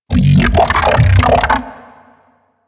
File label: Mech Ambient Vocal; Nechro Mech; Vehicles